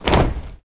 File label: Afro Feet; Roland BK-5; Percussion